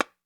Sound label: TAMBORIM, Latin Drums; Latin Drums & Rain